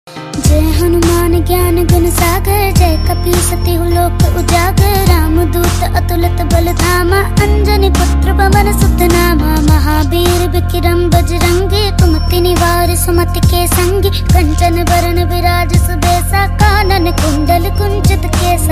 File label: Hanuman Chalisa Ringtone Child Voice